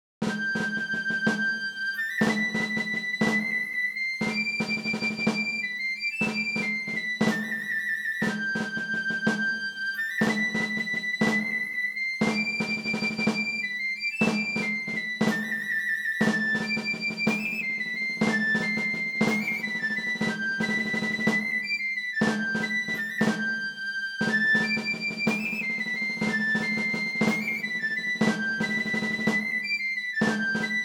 drapeau

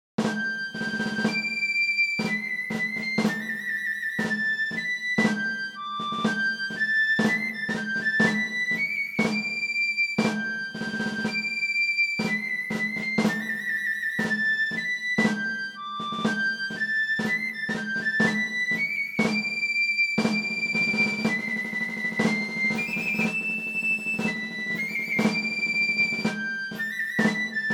retraite

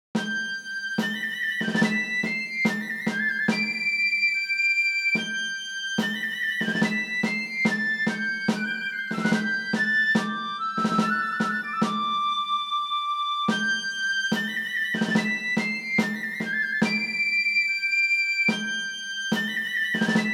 marche mousquetaires

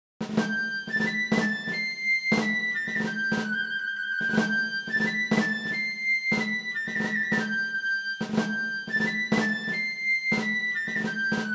priere

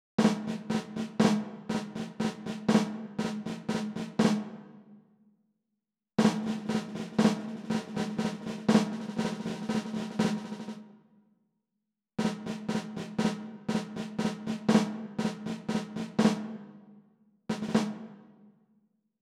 ban

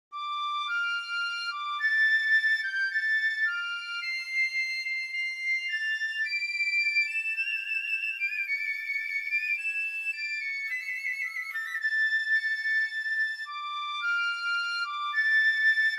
marche regiment roy

